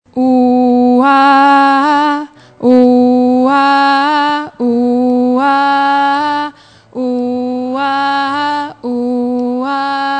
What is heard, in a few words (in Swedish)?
alt, stammor